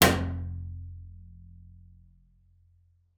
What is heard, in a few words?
F1, bass pizz; Xsample VOL-5